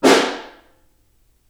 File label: Snare Far